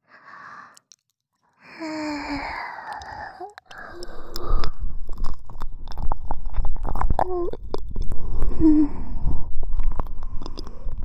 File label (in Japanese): 耳奥舐め＆耳奥埋め＆閉じ舐め, ASMR